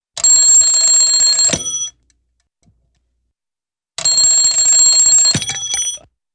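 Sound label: Old Alarm Clock Ring With shut Off, Cartoon; Hollywood Edge; Cartoon Trax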